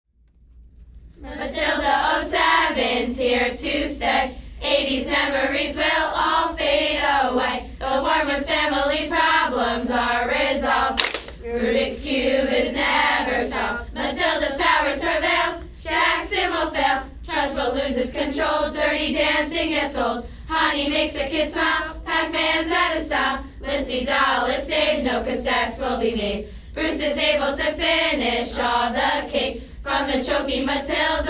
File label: cheers